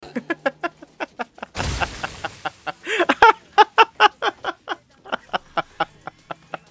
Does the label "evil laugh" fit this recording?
Yes